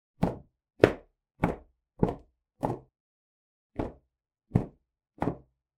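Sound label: towel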